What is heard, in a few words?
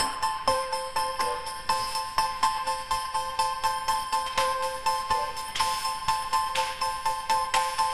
happy jingle; sound effects